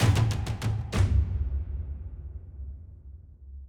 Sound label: Perc Wood E3 Med Outro; unruly heroes